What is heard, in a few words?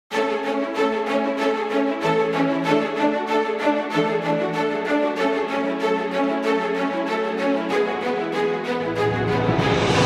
tee time fanfare